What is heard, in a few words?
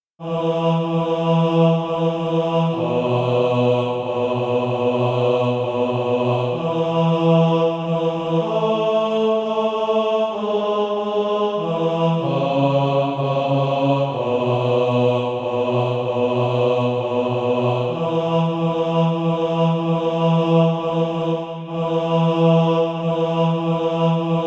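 bass; Eternity Where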